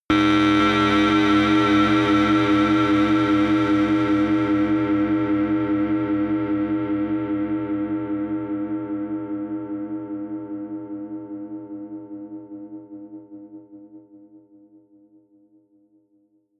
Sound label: stinger hum, drones; musikelemente